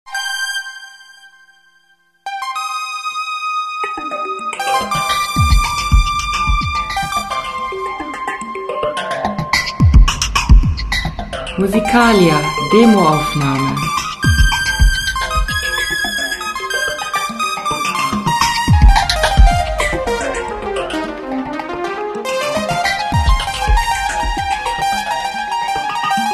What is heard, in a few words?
solo